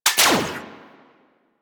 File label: Rifle Mech; Grn Kuva Lich Rifle